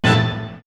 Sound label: ORCH HIT; X-Static Goldmine; backtothe70's